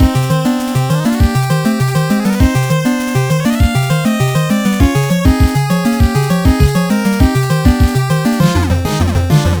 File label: 8bit Intro; Stage1; sdl2-snakegame; Dennis Gunia